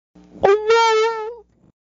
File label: Oh WOAH